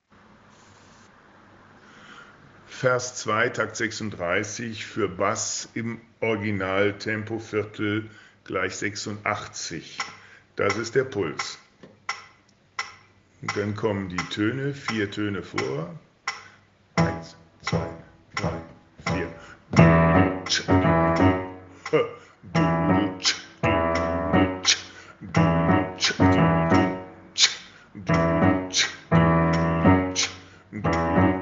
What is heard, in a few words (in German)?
Bass originaltempo